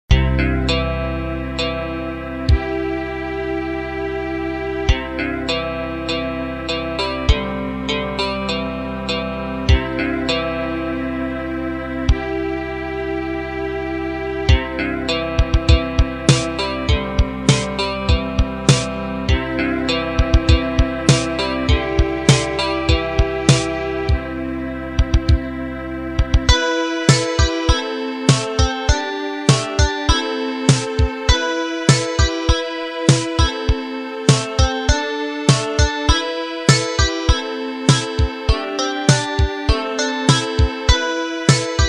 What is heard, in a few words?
beach and sailboats